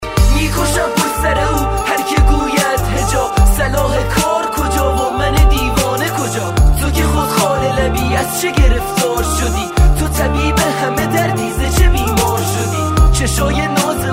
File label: Mobile ringtone